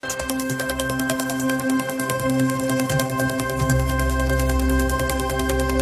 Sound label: tone; tripz